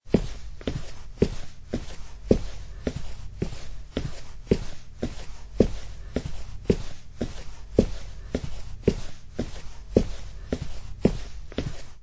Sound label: walk; threejs open world